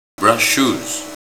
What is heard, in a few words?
brush shoes